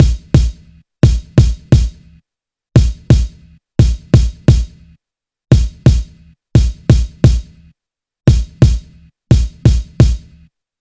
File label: Drum Loop - 87 BPM Kick, Boom Bap; Earth; Cymatics - ANALOG EVOLUTION Beta